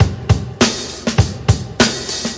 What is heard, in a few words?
classic brk; VIBRIS CLASSIC BREAKS